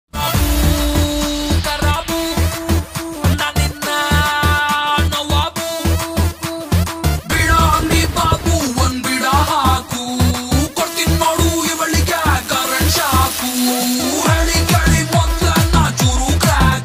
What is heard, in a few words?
tamil ringtone